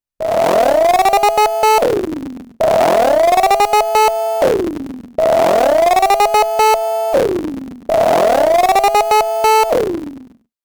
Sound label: MS-20 Reed Alert FX; MS-20 Patches